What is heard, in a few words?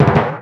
Totem Drums Lifting; Totem drums: Lifting / catching; Totem drums: Lifting / catching another Link